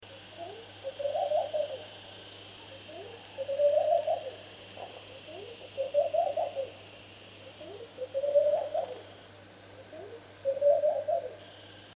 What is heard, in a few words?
Laughing Dove Sound